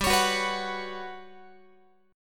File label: G Major 7th Flat 5th, Guitar; GM7b5; strummed